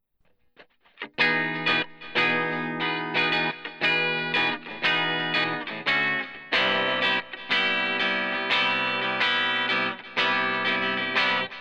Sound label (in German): E Guit Clean Virtual Amp mittlere Kompression